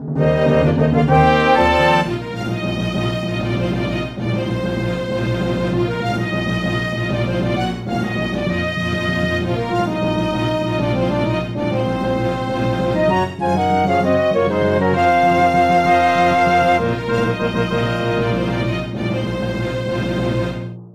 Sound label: Jingles Victory